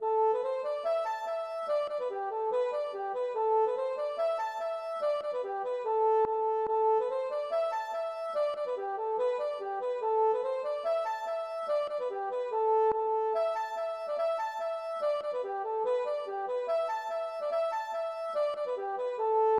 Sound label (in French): polka Irlandaise